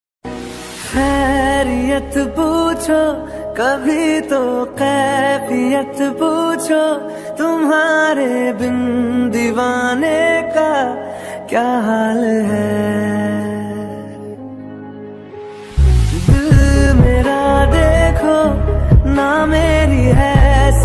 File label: trending music ringtone hindi ringtone mobile ringtones; tik tok